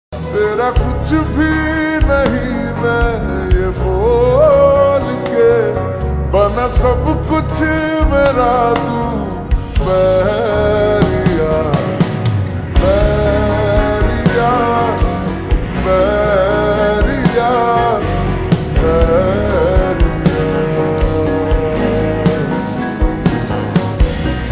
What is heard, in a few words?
Ringtone slowed and reverb